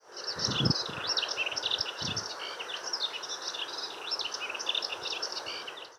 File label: Hypolais